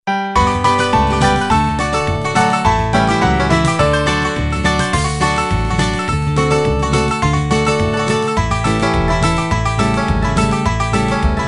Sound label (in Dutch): karaoke; oversteekmannetje